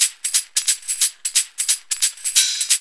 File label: LOOP; E-Lab; X Static Goldmine 2